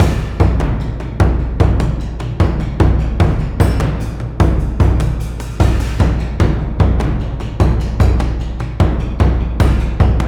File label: Suspense